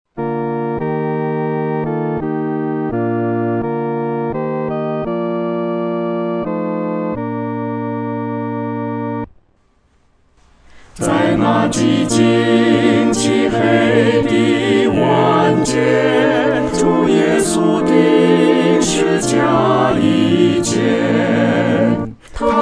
合唱 四声部

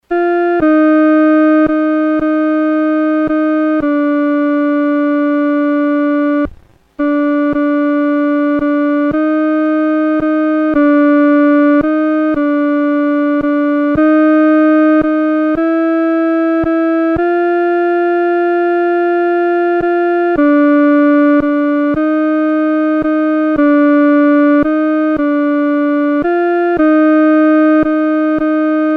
独奏 第二声

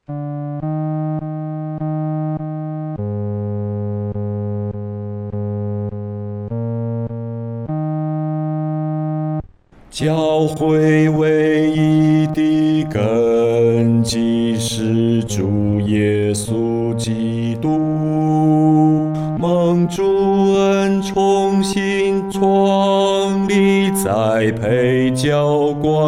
教会维一的根基 独唱（第四声）

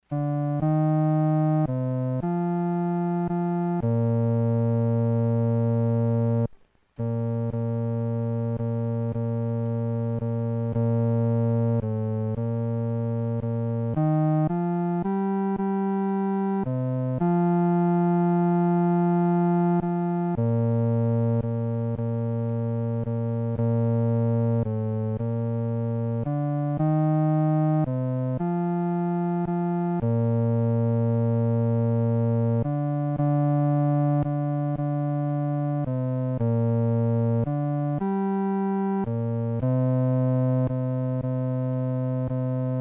独奏 第四声

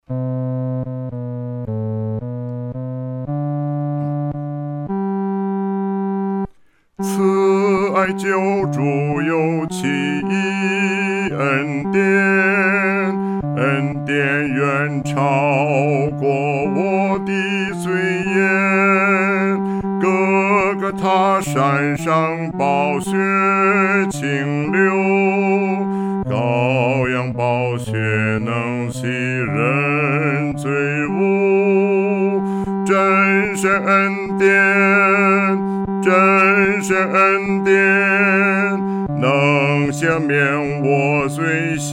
独唱 第四声

